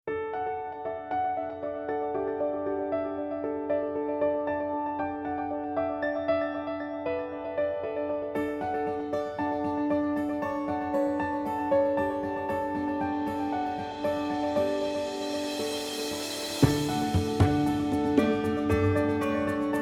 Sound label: Piano Instrumentals; hotusa recepcion y pasillos